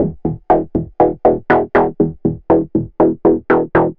120 MOD GUIT E, Modular Synth Loops; Polyend; Simulacrum Sounds

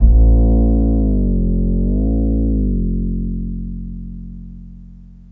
Synth Bass

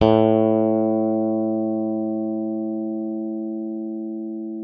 PLECTRON FX A2; Xsample VOL-1; 90 s Sample CDs

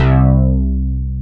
SYN, S CLAVI

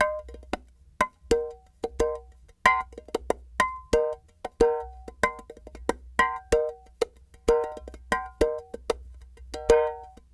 clay pot, world percussion

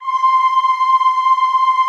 C5, Classical Choir; Best Service; Extended Classical Choir

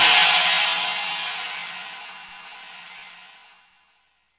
Cym; Roland R-70

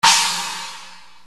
Yamaha RX7 CHINA

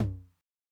Tom Hi Low Tune Mid Decay, 909

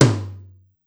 Tom Lo-Mid, Korg Wavestation-SR Drum Kit; Wave 455 - Drum Kit

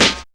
snare, Rave Kick Snare, Percussion; Dance Synths

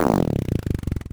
loop, techno atmos

